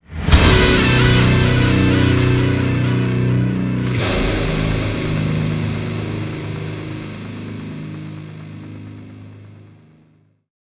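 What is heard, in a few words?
stinger guitar hit; transformers war for cybertron gamerip; IACON INVASION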